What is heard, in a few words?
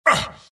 grunts; dota; skeleton king